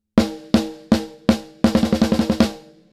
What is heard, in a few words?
Compressor snare